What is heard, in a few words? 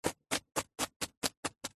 celery raw cut knife single; food